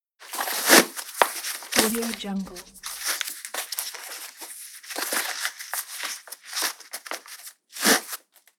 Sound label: shoe tying up